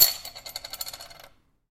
coin rattle

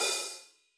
hi hat 14 inch slightly open

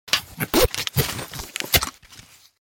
zipper short; soundscrate

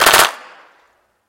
Assault Rifle Burst

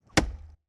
arrow hit

